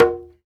Congas, African Contemporary; Spectrasonics; Supreme Beats

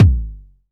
KIK, Pearl DRX-1

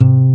guitar C2; E-MU Formula 4000 Series Vol. 5; Protozoa; Proteus3 Presets

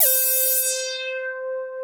HARD LEAD C5, PPG, analog; USB Soundscan vol.49 - Analog Fantasies; AKAI

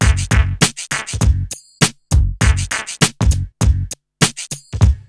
Burning Grunge Hip Hop, RNB 100; USB Soundscan vol.34; AKAI

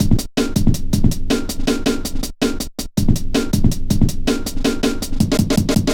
metallic stepz, drum loops; Zero-G Jungle Warfare 1, 2 & 3

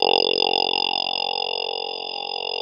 F#0 FOF vox, choir; NorthStar - The Wizard VOL-2; C-SND CHOIR

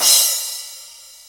JD800 Dance Card Drums

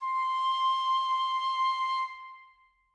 piccolo leg C6, Woodwinds; BBC

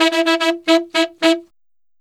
Sax Section in E, Jazz; Traditional; Sonic Foundry (Sony Creative Software); Jazz Solos and Sections

